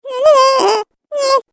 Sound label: Cartoon Game Voice Rude Sounds; Cartoon Voice Sound Effects